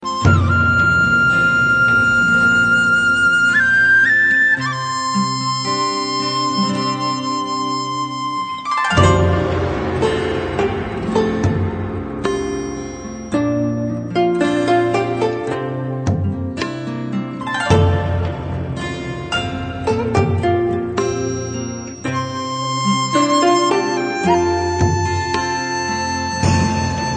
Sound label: classical music